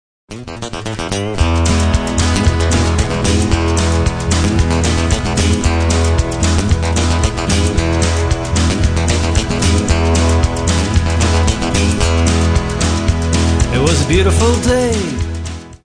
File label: running guitar riff